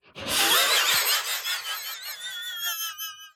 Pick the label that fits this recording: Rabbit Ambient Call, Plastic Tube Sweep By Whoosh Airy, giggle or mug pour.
giggle